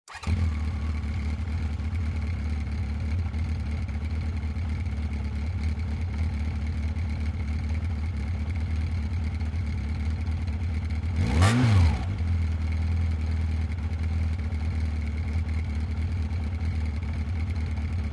Motorcycle starts, regassing, jamming

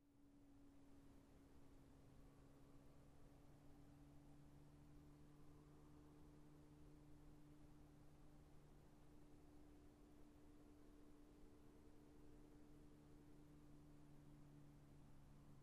Motorcycle approaching, turning off

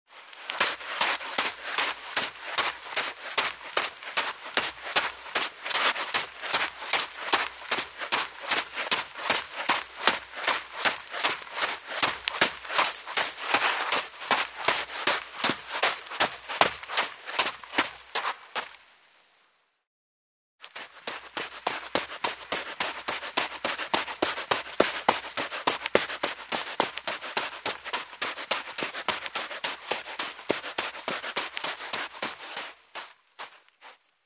Running on dry sand in shoes; steps

